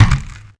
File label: Headcrash; xmoto